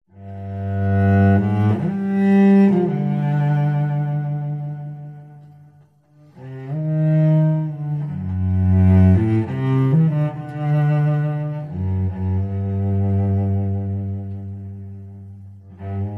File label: Melancholy Cello